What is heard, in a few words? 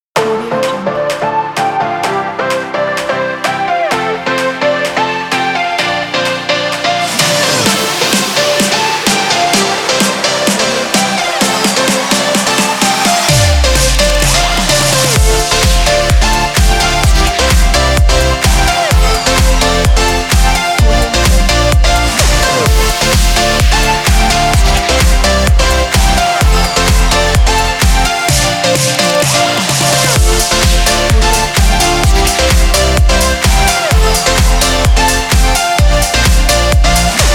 fitness